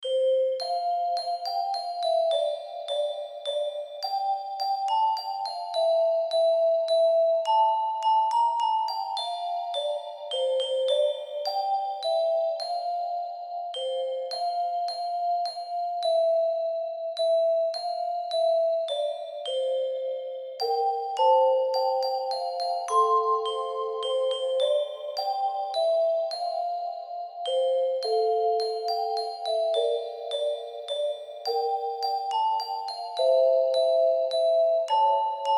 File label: we wish you a merry christmas bells background xmas music